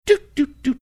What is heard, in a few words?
making punctuated sounds